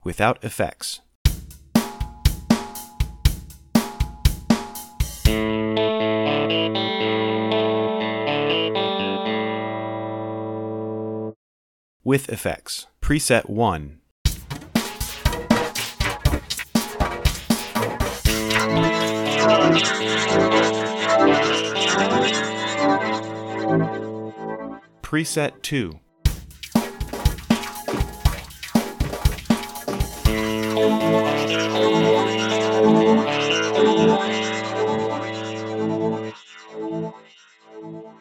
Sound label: particle delay; effects; pod4500; Mixcraft